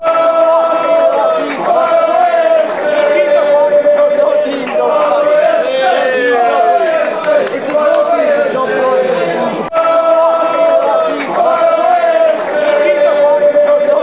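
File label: barrow afc, football songs and soccer chants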